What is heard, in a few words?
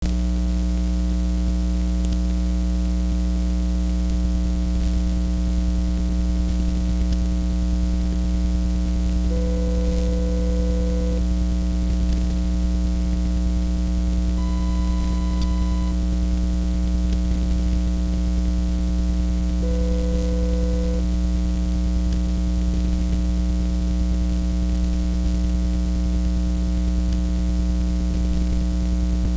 tone test signal and power though cable